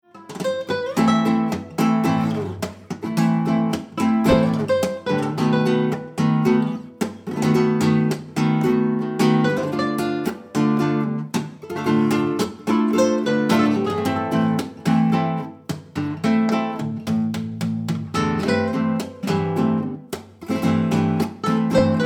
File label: Guitar